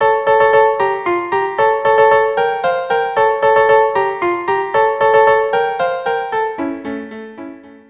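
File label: violin duo; Carmen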